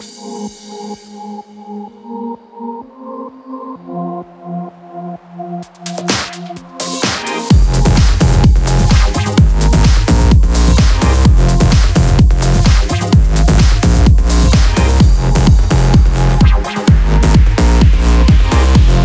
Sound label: 128BPM Mix; Vengeance Samples; One Stop Kit